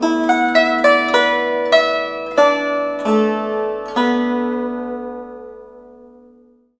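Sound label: guzheng; Spectrasonics; Heart of Asia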